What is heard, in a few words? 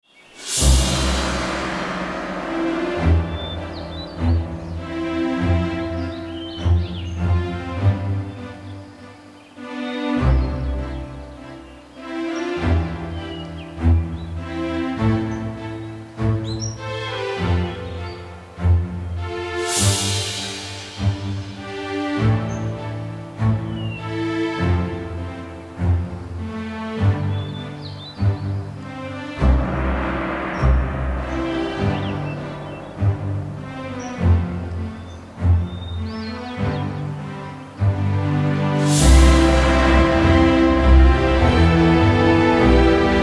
Peace Relax; Serious Sam 2; Ellenier Town